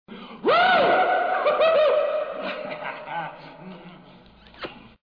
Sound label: WOOOW Kiss